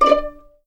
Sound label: D5 PIZ MF, string; East West; The Ultimate String Collection